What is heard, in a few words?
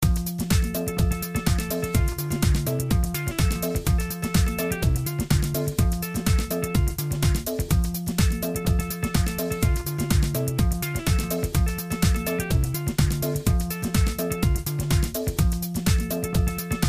Guitar Rift Loop